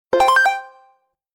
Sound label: bonus